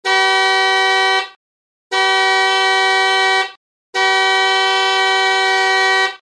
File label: horn